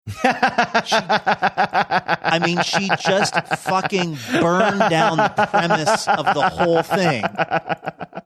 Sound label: having good long laugh